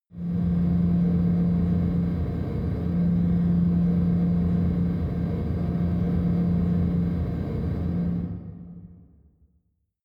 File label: machine room drone